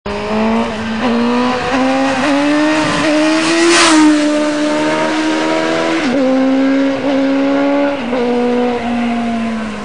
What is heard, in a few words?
750 yzf